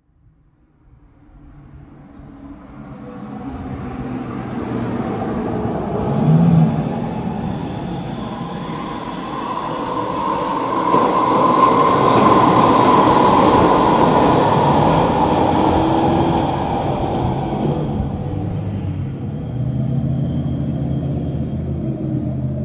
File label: Vancouver Noise mono shotgun, traffic